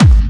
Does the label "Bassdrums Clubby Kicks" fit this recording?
Yes